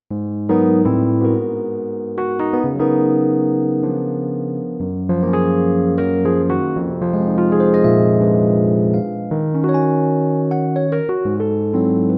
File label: fender rhodes; sibelius rock and pop